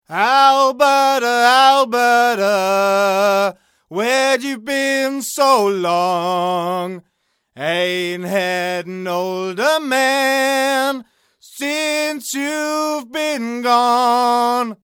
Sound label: t.bone SC450 S2 far